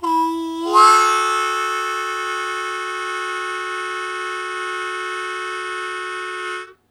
Blues Chord Attack D1, Harmonica; Zero G - Harmonica

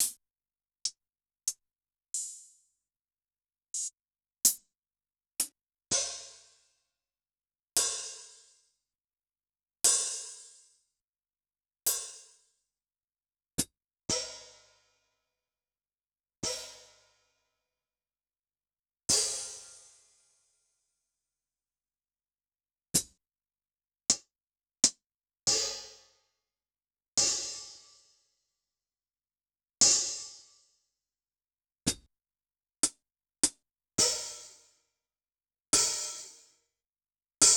Yamaha DTXpress-II Hi Hat; Sliced

